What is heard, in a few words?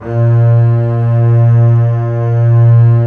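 Bass Legato A#2; Multisamples; Digital Sound Factory Virtuoso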